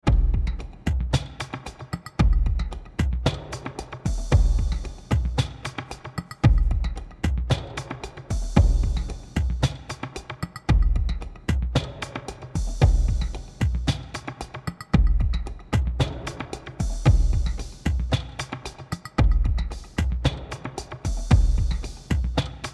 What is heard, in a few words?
groove